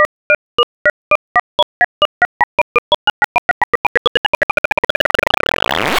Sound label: slow 2 fast